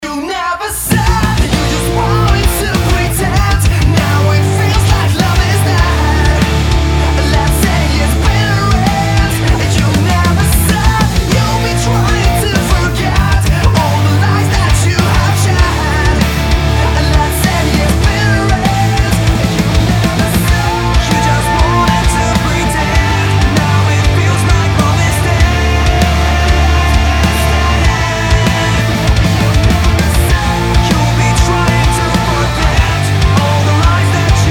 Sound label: MIX MKIV AXE